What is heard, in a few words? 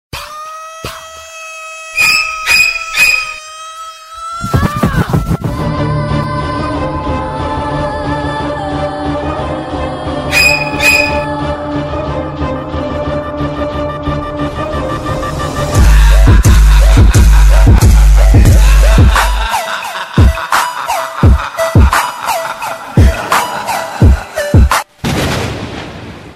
laugh; ringtones